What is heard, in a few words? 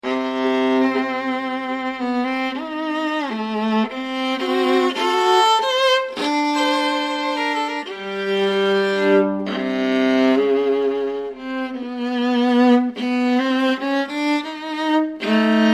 Viola; honduras musical 7mo grado